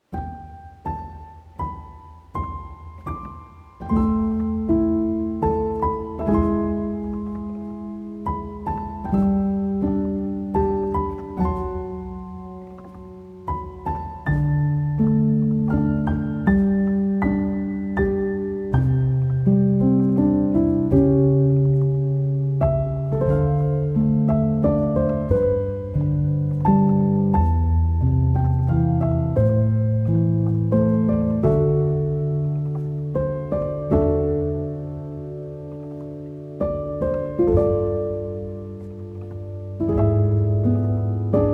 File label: Sleepy Version, Piano Version; Kiki's Delivery Service